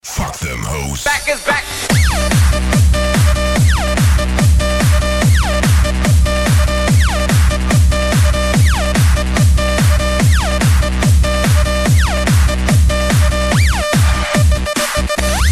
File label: Hardstyle